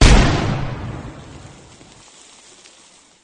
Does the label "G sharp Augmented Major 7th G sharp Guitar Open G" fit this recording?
No